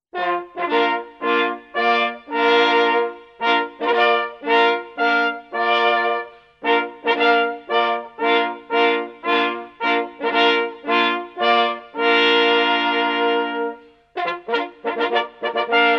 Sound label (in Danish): fødselsedags fanfare flerstemmig